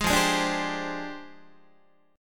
F Minor 6th Add 9th F down Guitar; JGuitar

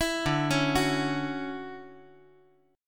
D-Major 9th D down

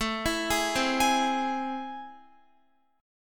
A Minor Major 7th, down, Guitar; AmM7; arpeggiated

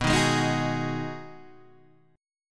B 6th B down Guitar Open D

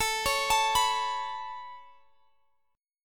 A Major Add 9th A Guitar Standard, chord; Aadd9 arpeggiated; JGuitar

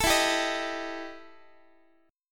E Minor Major 7th Double Flat 5th E Guitar; strummed; JGuitar

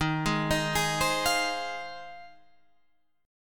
F Minor 7th D sharp

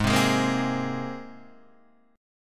Ab Major Add 9th Ab down Guitar DADGAD; Abadd9 strummed; Abadd9 chord; JGuitar

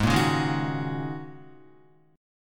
Ab 7th Suspended 2nd Suspended 4th down Guitar; strummed

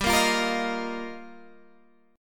G Minor 7th Sharp 5th, Guitar, Open G

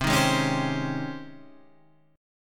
G sharp Major 11th C

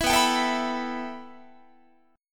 B 7th Suspended 4th Sharp 5th B down Guitar

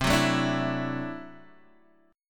D-Major Add 9th C down Guitar Standard; strummed; JGuitar

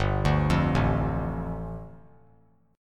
G 7th Suspended 2nd; arpeggiated; JGuitar

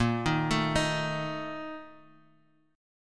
A sharp Major 7th Suspended 4th; arpeggiated; JGuitar

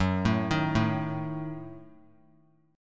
F sharp Minor 6th F sharp; arpeggiated; JGuitar

